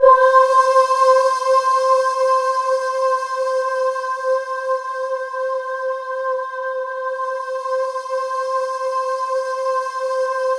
C4, Synth Leads

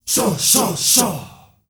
SHAWSHAW L, GRP CHANT, Heart of Africa; Spectrasonics; Heart of Africa 1

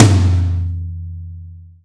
XV Tom Lo, Roland XV-5080

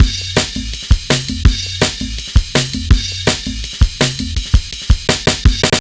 165, Drum Loops, Drum & Bass

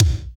BD, Dance; Sound & Vision; Gigapack I CD 1

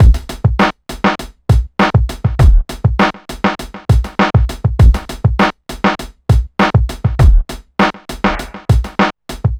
pure groove 100bpm

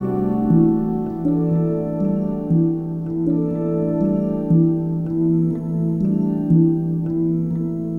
Pretty Poink, Pastoral; Sonic Foundry (Sony Creative Software) - Friendly Fire

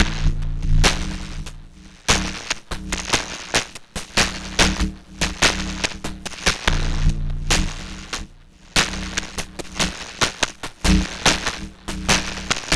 Unstable Groove; Extra Tweak Grooves

